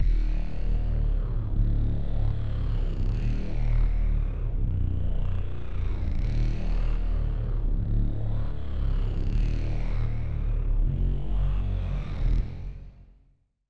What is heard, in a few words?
Sub Loop; Sednagon